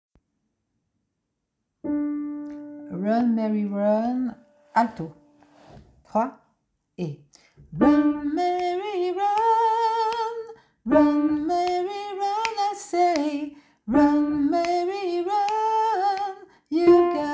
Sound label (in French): VOIX ALTO